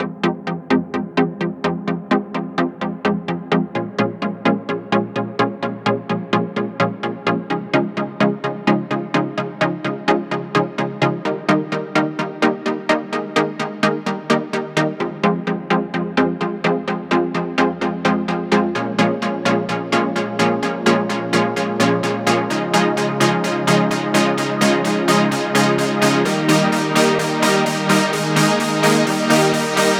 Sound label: NuTrance Kit Melody; Mutekki Media; Vengeance Electroshock Vol.2